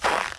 snow; valve

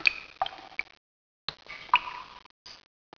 drips, ambience; valve

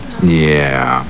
yeaa; valve

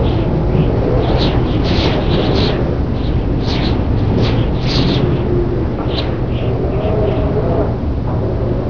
wind; valve

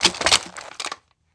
ammo pickup; valve; items

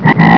die; valve

